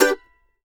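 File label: mandolin G major, Country; Sampleheads; Whole Lotta Country 1 & 2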